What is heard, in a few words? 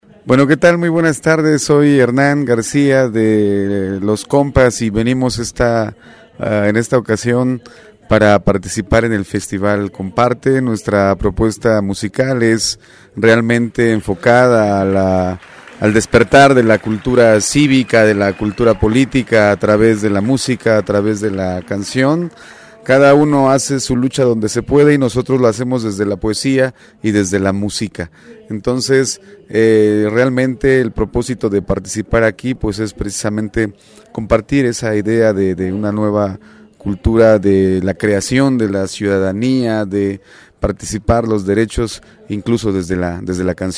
Zapa Cumbia; CompArte